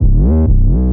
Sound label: 808 fill